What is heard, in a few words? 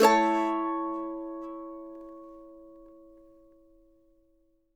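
MANDO MAJ A major down, Left; Sampleheads; Whole Lotta Country 1 & 2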